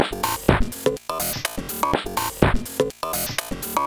boucle hauteur; cakewalk project52

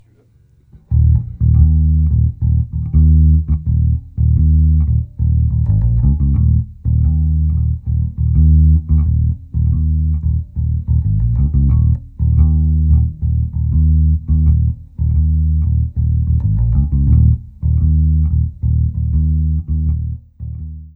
basse micro seul; little labs ipb

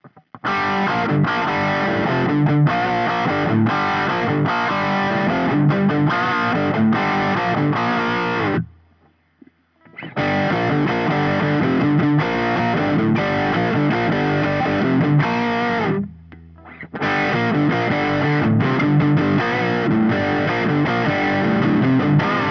sature tous micros

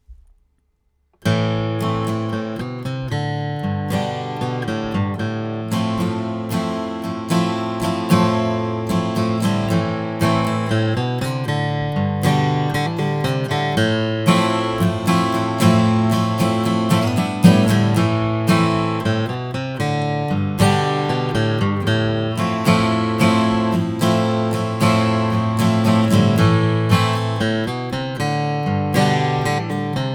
guitare u47; prodipe; lamp studio pro

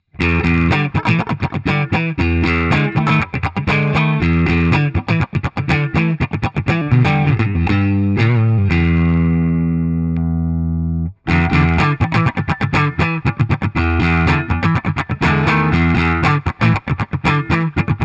centre et manche clean, fender hotrod57

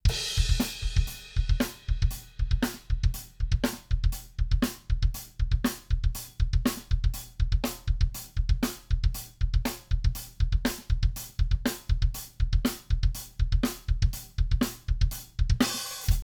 batterie flat; little labs ipb

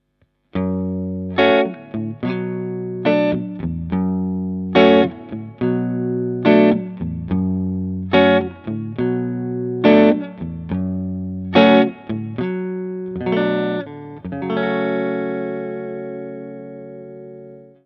clair sans rien manche boost; bogner alchemist

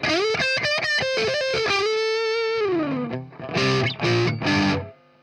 jazzmast sat, fender classic player

